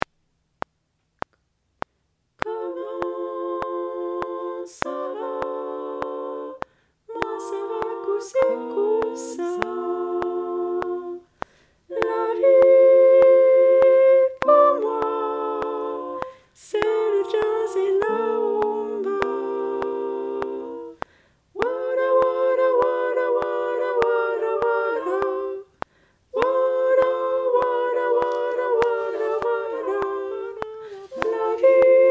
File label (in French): Sopranes